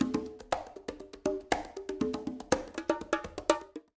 Ethnic Percussion

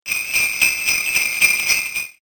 sleigh

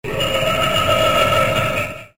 laugh

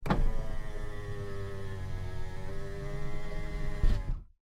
Car Window Close

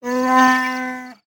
WOOKIE

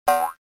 jump

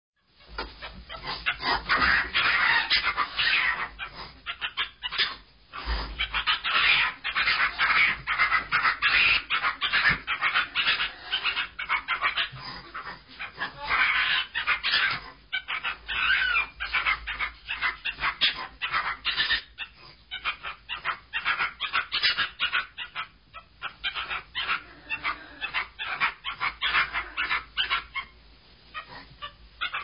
Monkeys

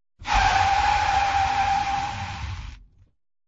tires screeching (exterior)

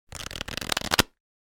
shuffling cards

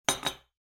coffee cup

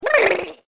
mailbox

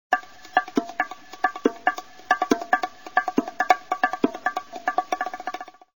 bongos; Mono

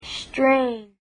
strain

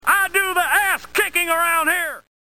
ass kick

